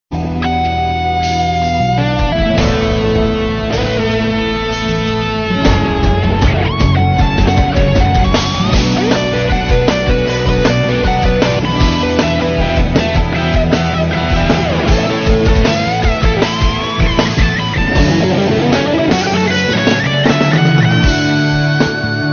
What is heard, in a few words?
Guitar Tune Ringtone